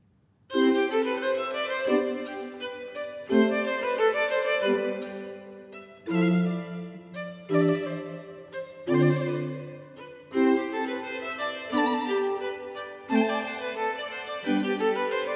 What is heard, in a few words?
Sonate 12 in D major - 2. Largo e puntato; Archangelo Corelli; Arcangelo Corelli; Corelli - Complete Works; Klassika